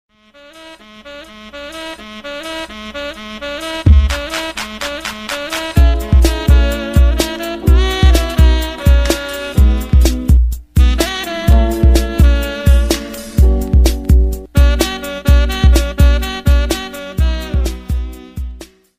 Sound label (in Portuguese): funk do sax